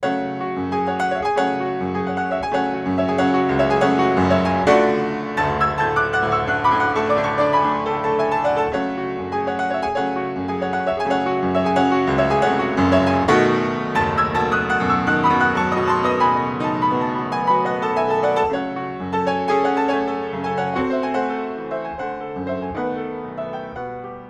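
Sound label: domra, Neumann TLM170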